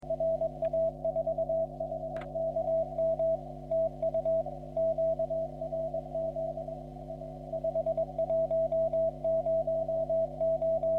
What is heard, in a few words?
40m cw